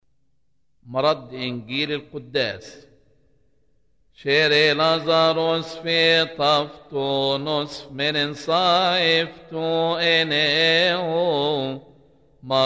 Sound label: Liturgy Gospel Response; Lazarus Saturday; Liturgy Bible Response; Hymns; Other Occasions